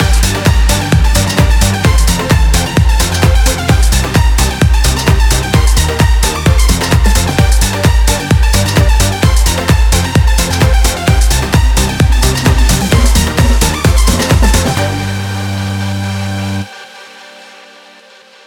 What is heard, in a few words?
155 EDM; MATSU-BEAT